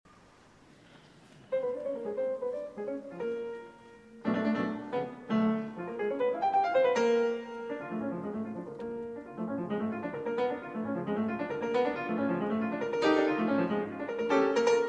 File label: Piano Sonata in B-Flat Major, Allegro Inquieto; Prokofiev; Piano Sonata No. 7; Op. 83 I.